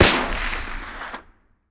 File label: fire dist; gmod; arccw uc commons attachments